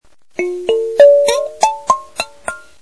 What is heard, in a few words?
pendant, kalimba